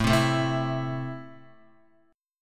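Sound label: A Minor, Guitar, chord strum; Am strummed; JGuitar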